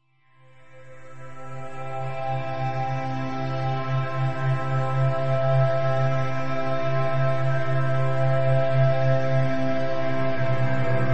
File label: Ambient Strings